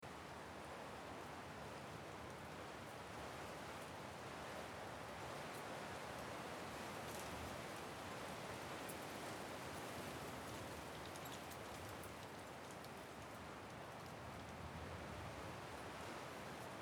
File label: Sheltered by trees; kent downs uk